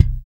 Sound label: 6-STR FUNK, bass; Spectrasonics; Bass Legends